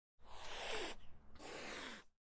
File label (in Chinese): 井旁音效; 吕萍